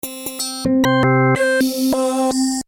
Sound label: pcm, roland jd800; synth